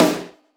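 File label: Room Snare alt, Roland BK-5; Snares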